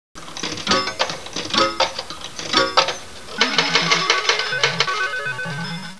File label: boinks